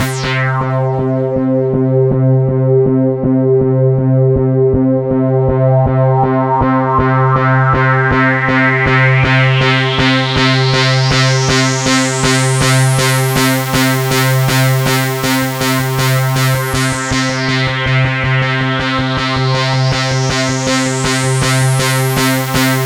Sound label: C3, SynthLine